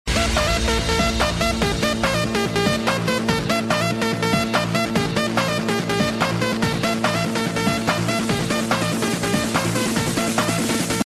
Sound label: disco beat